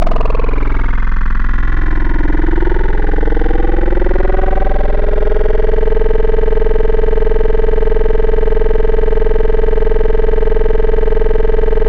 Vocal Ayee Ahh; Wavetables; Synthetic's Serum Collection; ESW Core Wavetables; Echo Sound Works Core Tables

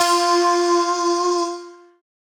Flute SAT, noises; HYPERBITS

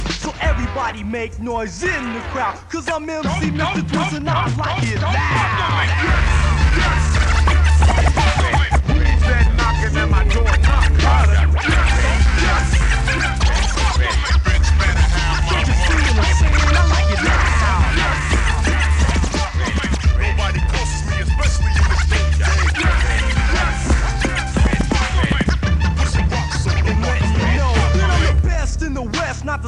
Make Noise Mix

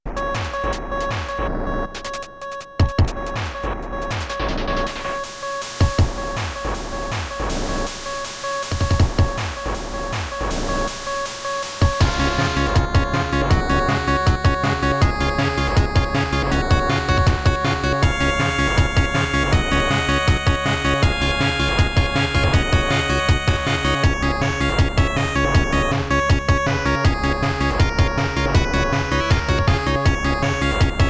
Internal Percussion; studio pixel; Mischievous Robot Beta

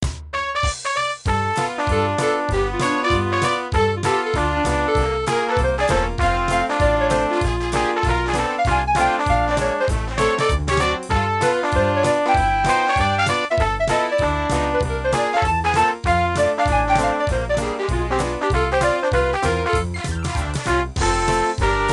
STOMP; Windows Sounds; Windows 3.0

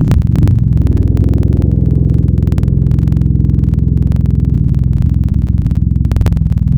Farty Tuba; Synthetic's Serum Collection

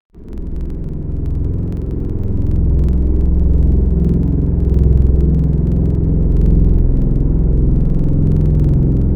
String Quartet; Synthetic's Serum Collection; Echo Sound Works Core Tables; ESW Real

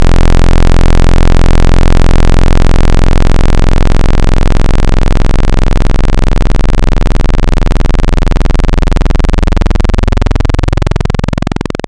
Analog JP800 Square; Synthetic's Serum Collection; Echo Sound Works Core Tables

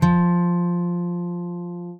Gibson Acou F1; ESW Sphere

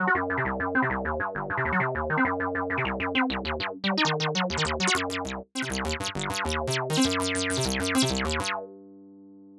acid sequence; 'Go Fuck Yourself' samples; lolicore

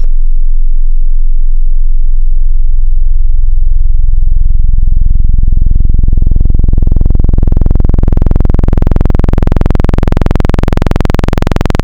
Sub Sine; Wavetables; Synthetic's Serum Collection; ESW Core Wavetables; Echo Sound Works Core Tables

